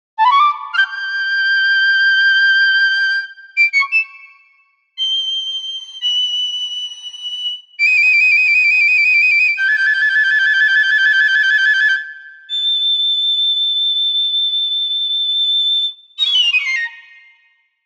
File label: FLAUTO PICCOLO